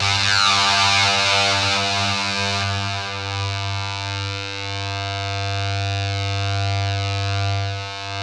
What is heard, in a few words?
ZWEEP; Spectrasonic Distorted Reality 1